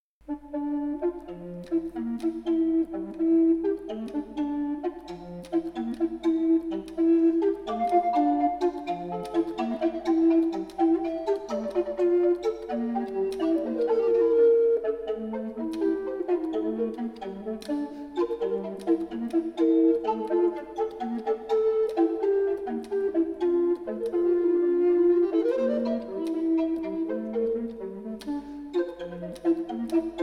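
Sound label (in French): petit allegro pour deux flûtes bec; gall andra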